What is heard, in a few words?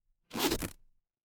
paper fabric zipper